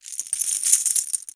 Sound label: chips, casino; Bicycle Casino; XBOX